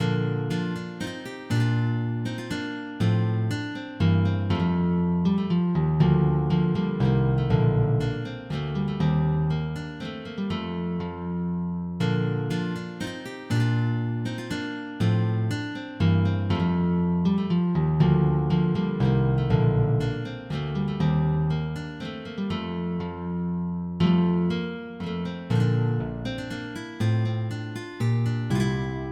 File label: Sarabande, MIDI